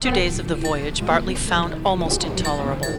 noisy

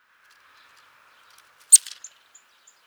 Sitta europaea; SETE

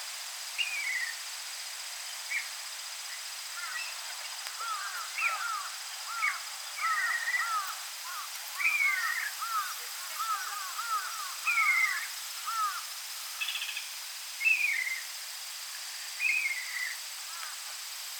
Garrulus glandarius; SETE

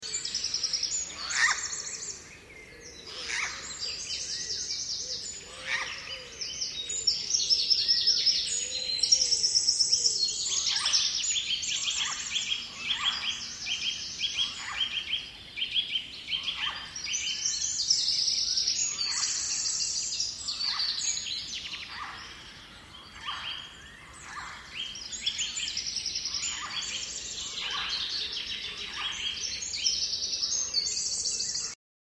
Garrulus glandarius; SETE

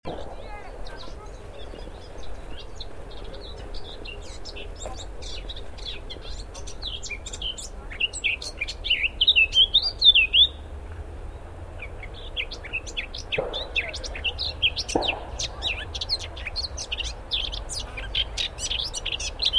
Sylvia atricapilla; SETE

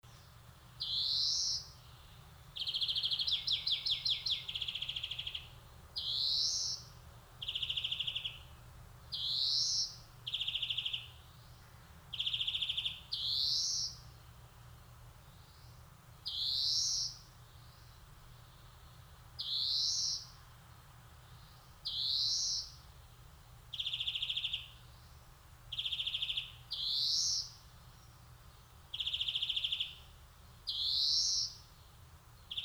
Chloris chloris; SETE

